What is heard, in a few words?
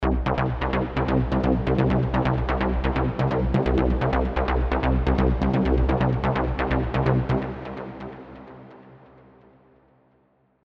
Dance Lineup; Nexus 3